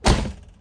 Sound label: Electro FX; Ueb HE Electro FX